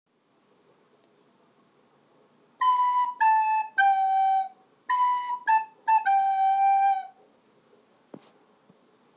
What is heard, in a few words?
Recorder